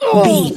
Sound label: voice female hurt pain